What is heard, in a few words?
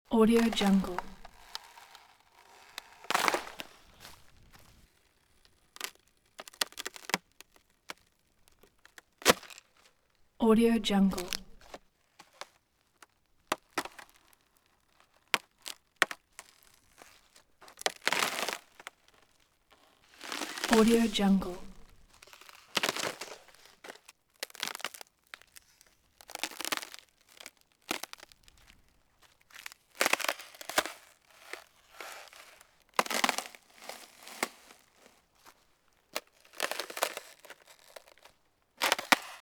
slow footsteps on breaking ice